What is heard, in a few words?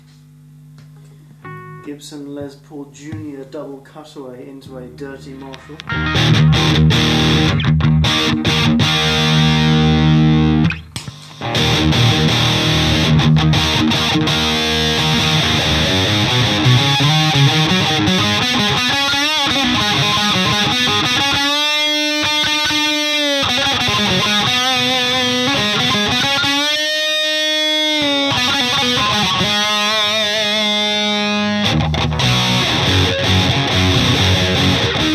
TB LP Jr DC Marshall, Treble Booster; Treble Booster Mk II